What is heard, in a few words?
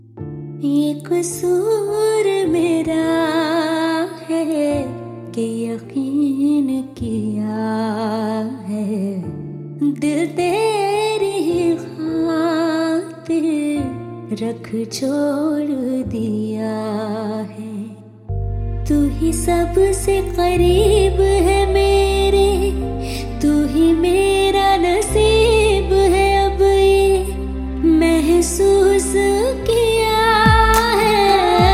New Hindi Female Ringtone